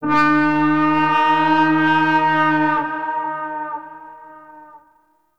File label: Town Horn Alarm; Ambience; Gameplay; Duviri